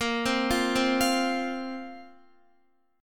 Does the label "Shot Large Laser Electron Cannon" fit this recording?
No